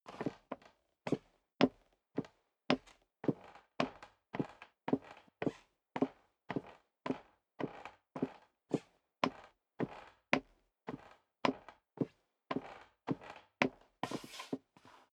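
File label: step leather shoes wood creak upstairs sequence, footsteps; schritte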